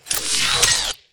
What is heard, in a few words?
ion in; garrysmod